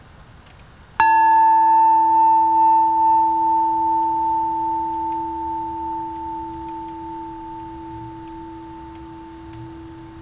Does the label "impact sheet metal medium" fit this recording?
No